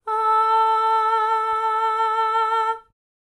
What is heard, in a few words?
Vocals Soundwave